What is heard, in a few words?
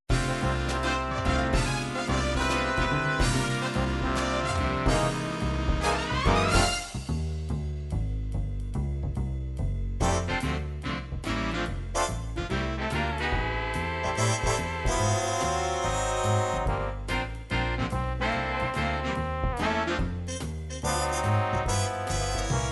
jazz generic, midi